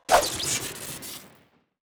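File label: crowbar miss crit; weapons; tf2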